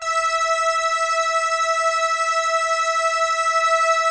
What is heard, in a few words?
WIRE BRUSH; Greytsounds - Scott Peers Sound Engineering